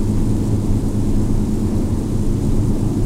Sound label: avro lancaster cockpit, engines; War Thunder